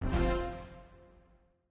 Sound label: win; Evoplay; Heads Or Tails